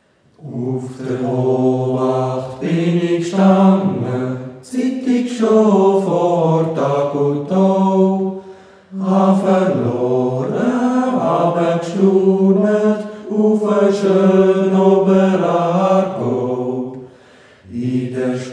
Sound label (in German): Bass